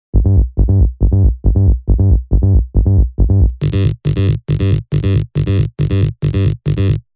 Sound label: Sub Push, techno bass; Spire; revealed spire techno bass vol 1